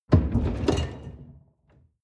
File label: folding door open short